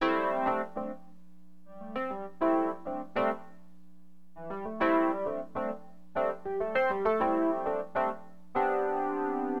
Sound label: RHODES, Keyboards; LiveAct Pool 1; AcidJazz Funk